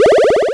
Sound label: powerup; OpHog